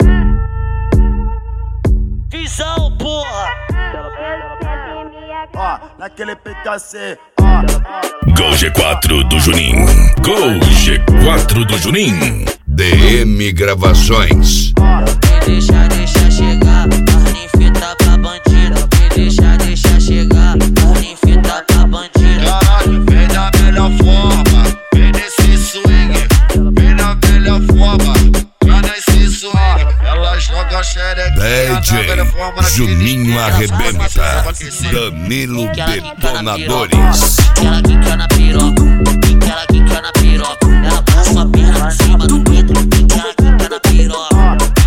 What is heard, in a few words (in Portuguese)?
eletro funk